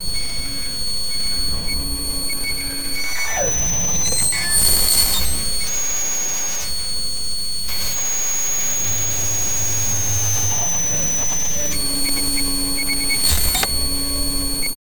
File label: steampunk radar sounds, navigation